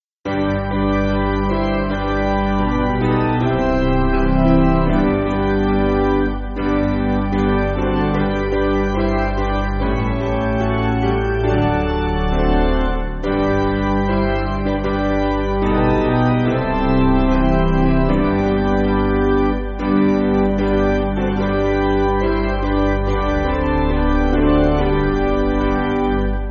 Hymnary Piano; Basic Piano & Organ

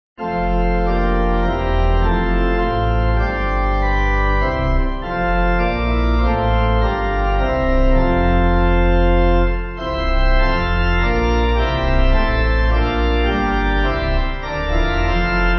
Pipe

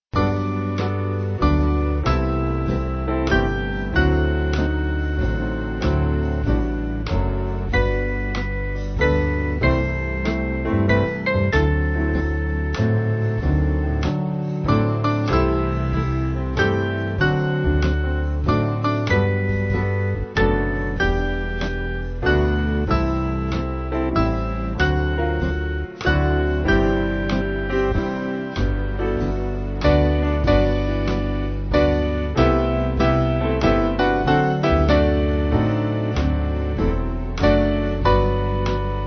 Band

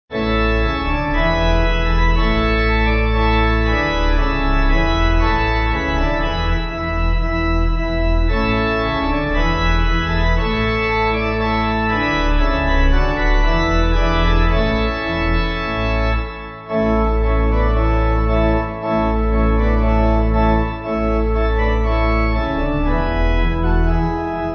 Pipe Organ

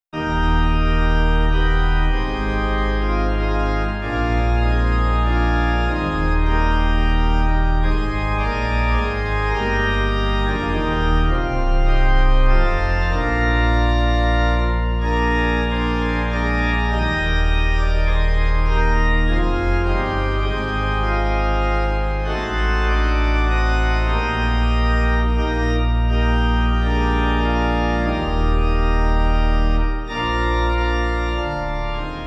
Organ

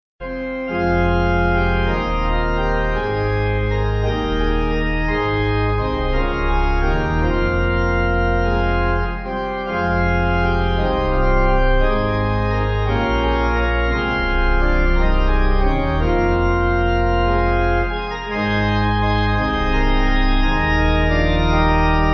Pipe

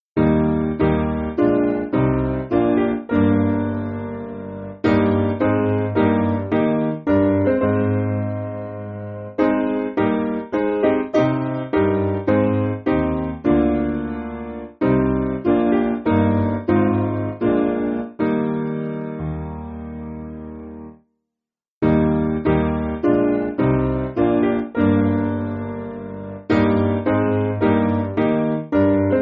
Selma, Piano; Simple Piano

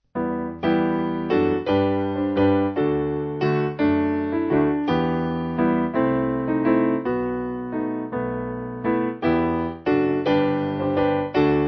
What Child Is This, Greensleeves, Piano

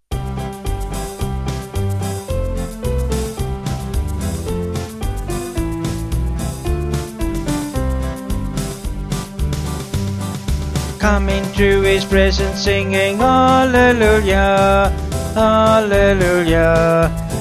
Vocals Band; Come Into His Presence

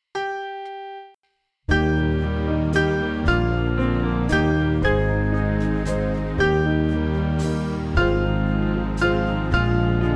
Band

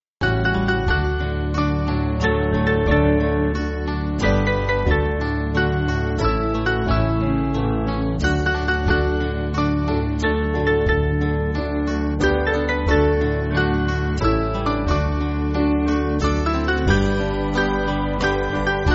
Band, Music Backing

